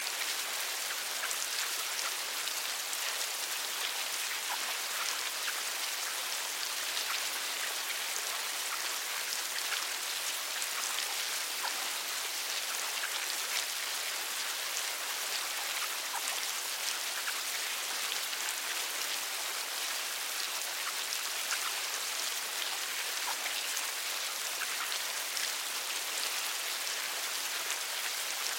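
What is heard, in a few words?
worm sounds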